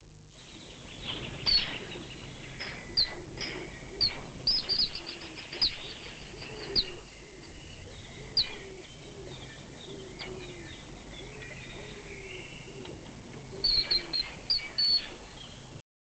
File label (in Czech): zvuk, ptaci